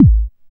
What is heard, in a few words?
BD ANALOG; Sound & Vision - Gigapack II CD 2